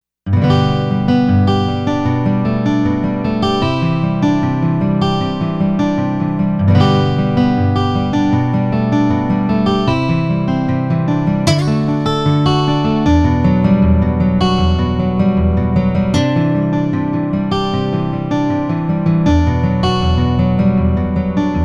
acoustic guitar

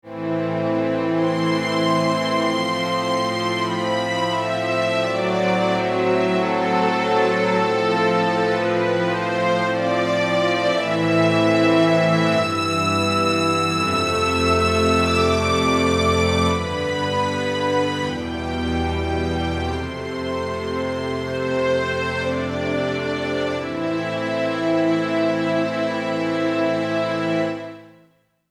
magestic strings; fantom xa

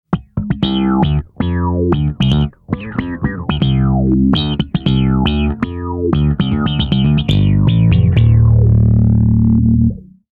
tone demo

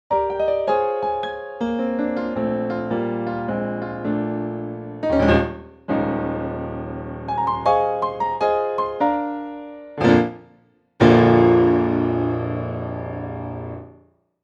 expressive grand

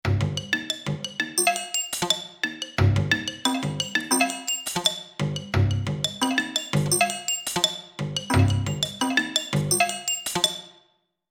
perc set

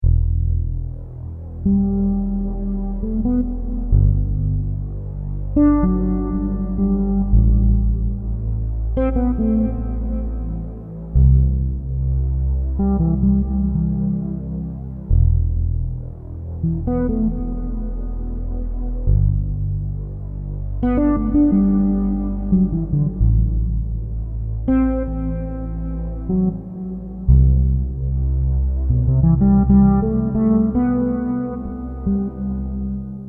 new age fretless; fantom xa

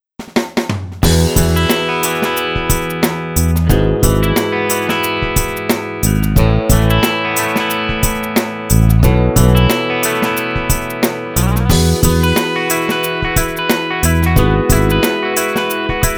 patch demo

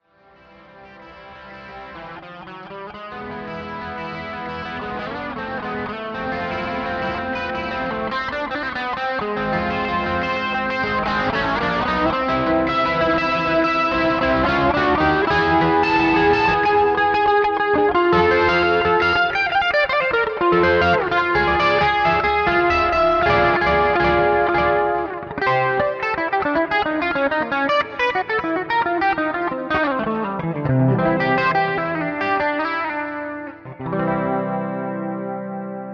clean; gs10 friedman

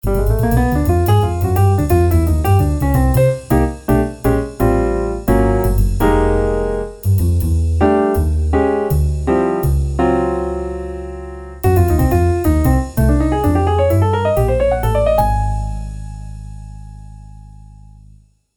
jazz trio; fantom-g

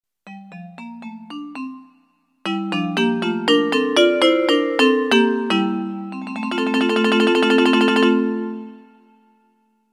melody bell; SPD 20

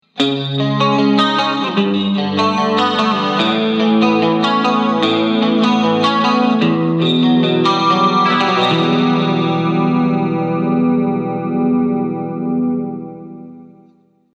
jc clean chorus; cube 30

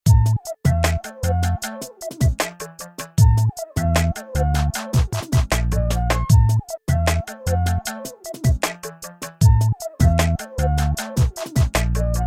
g funk

